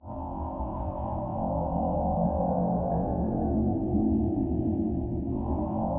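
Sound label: alteration ready loop; Skyrim Special Edition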